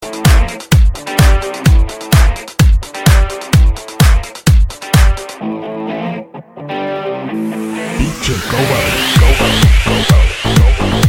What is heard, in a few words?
Electro Mix